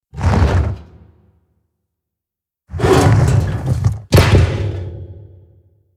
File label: Boulder Move